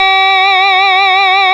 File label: OC-OR BE G3, SYNTHESIZER